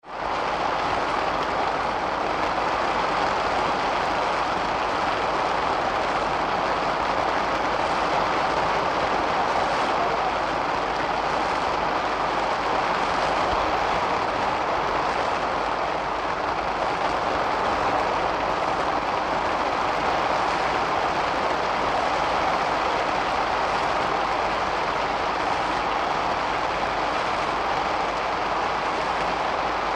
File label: Rain Canvas Car Top